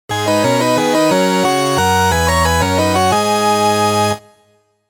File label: game over; cotm; castlevania